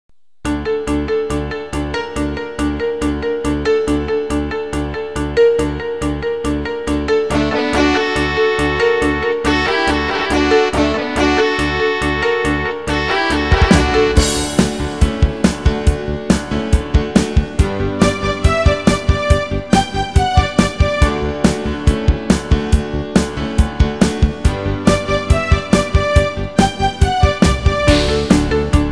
backing

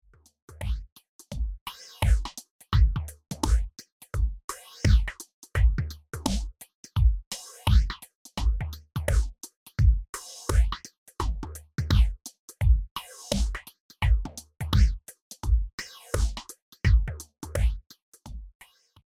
drums phaser

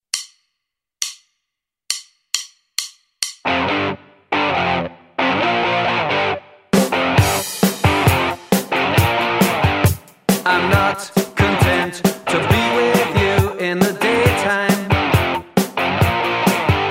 bass backing

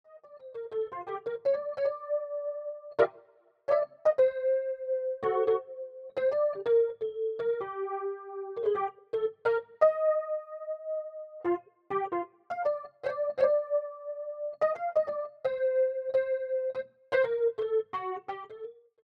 electric piano chorus

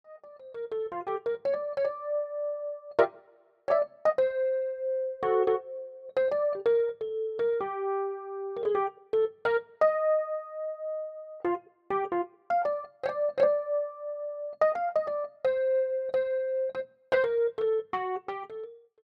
electric piano dry

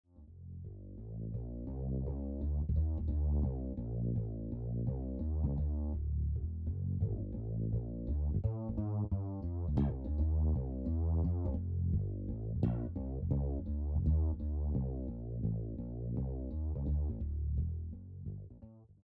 bass chorus

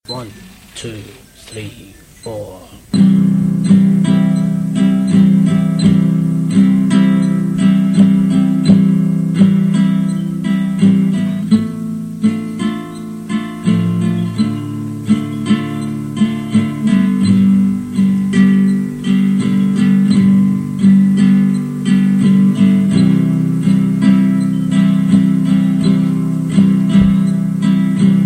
guitar backing track

